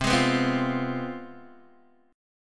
C Major 7th Suspended 4th Sharp 5th, Guitar, DADGAD